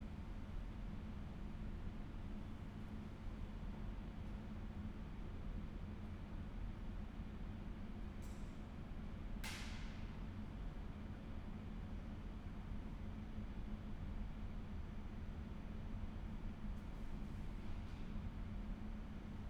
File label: Background noise; STI